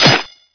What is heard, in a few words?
hit wall; knife; crysis